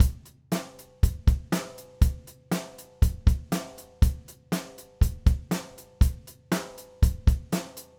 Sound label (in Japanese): ゆったり 8ビート ドラム ドンタンドドタン with クローズ ハイハット